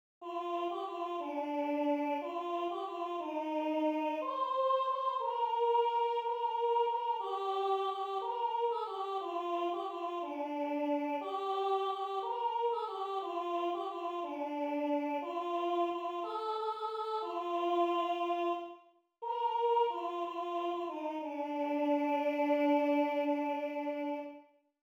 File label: mezzo-soprano; stille nacht 6 part